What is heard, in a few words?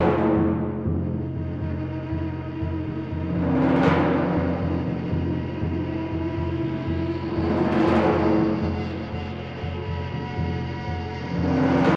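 INTRO PERCUSSION GUITAR; gamerip; ZETAS LAST STAND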